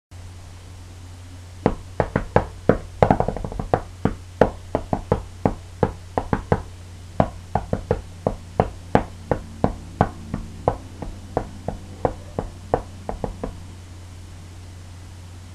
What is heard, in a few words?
perc